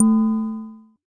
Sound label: FM Cymbal, harsh; Harsh FM Cymbals